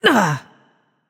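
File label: grunt attack, female; smite; voicepack; hera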